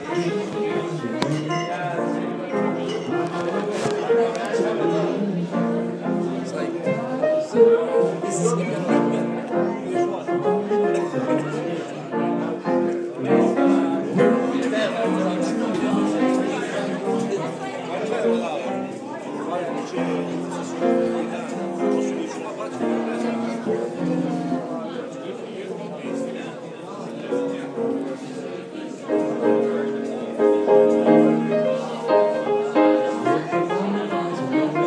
jazz; Late night hotel bar jazz. With a 'Just Take Five' homage/ flourish.

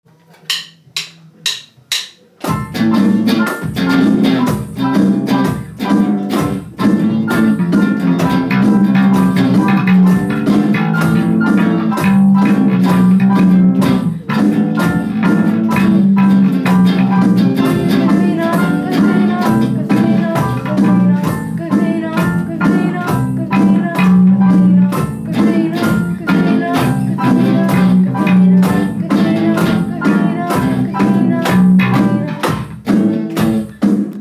riff

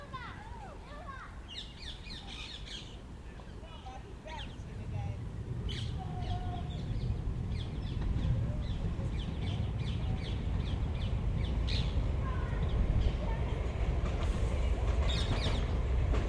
parrots in the park by the tube station

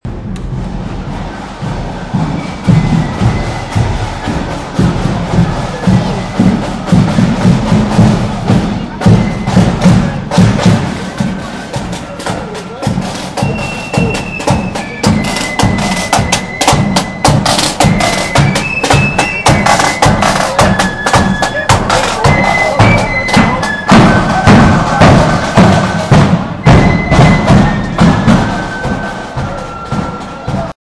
the sound of glasgow in summer

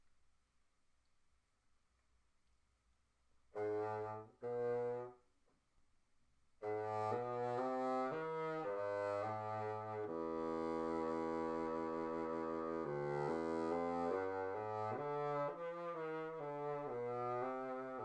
on bassoon

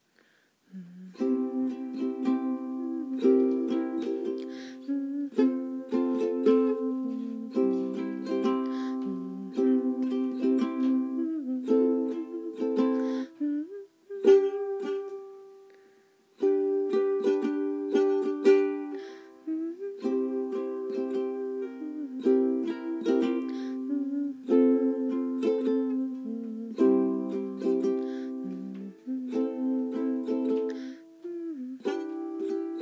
ukulele practice

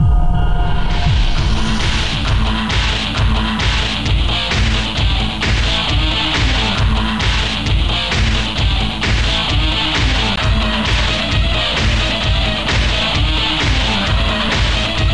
backing track

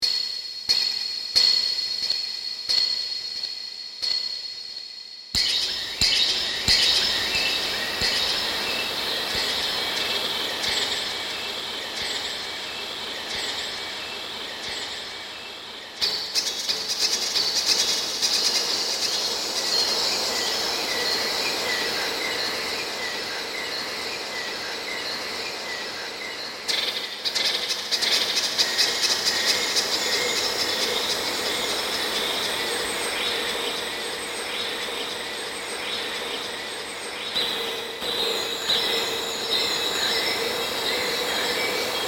birdsong in dub

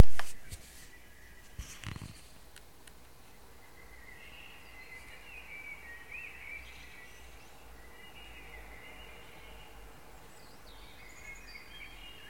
mostly inaudible blackbird song

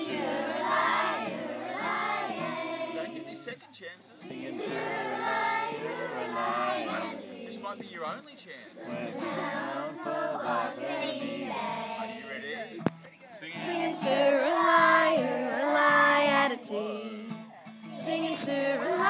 campfire singing

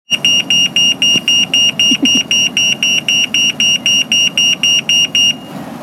traffic signal

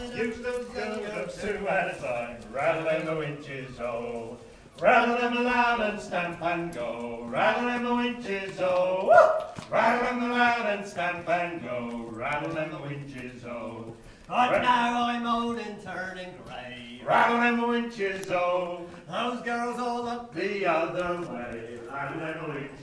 singing in a cave; porthgwarra

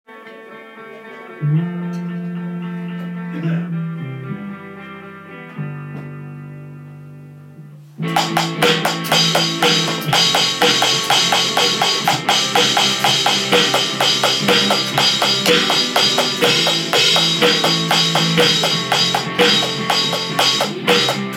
drums

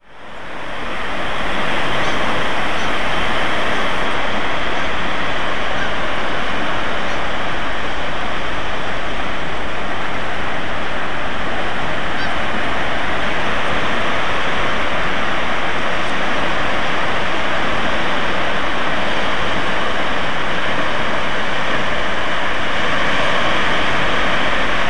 surf and gulls on swansea bay

